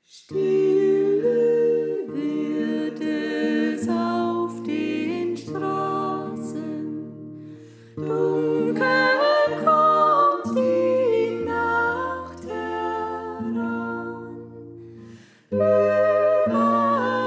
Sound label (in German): Sopran; Stille Wird Es Auf Den Straßen